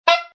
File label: Sax Squeak, A saxophone squeak.; Funny Musical